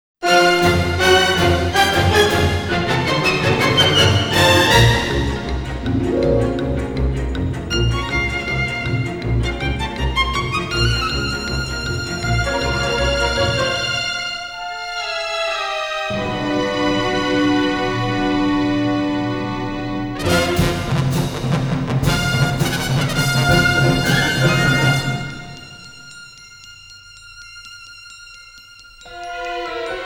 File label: Prelude (Orchestra Version); Chocobo no Fushigina Dungeon original soundtrack 2006